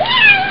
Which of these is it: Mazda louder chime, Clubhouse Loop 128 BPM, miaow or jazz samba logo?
miaow